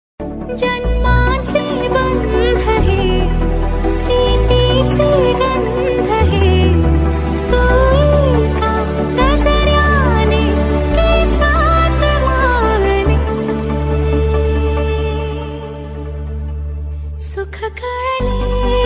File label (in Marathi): मरठ रगटन ringtone